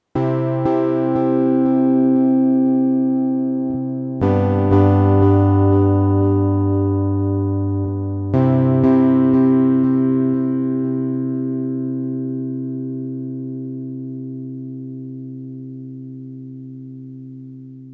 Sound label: delay